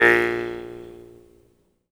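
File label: Jaw Harps, Pitched Percussion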